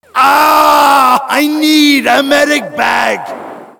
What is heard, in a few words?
aaaaaaah